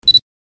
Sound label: Canon Focus Sound